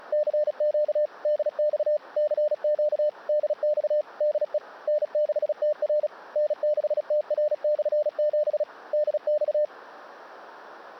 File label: 30 WPM remote